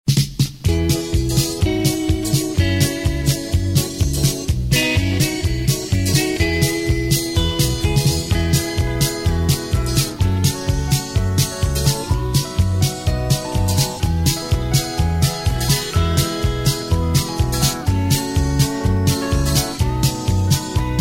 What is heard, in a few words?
harmony